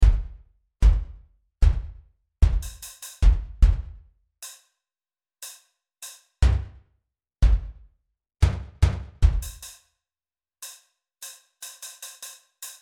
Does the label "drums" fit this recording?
Yes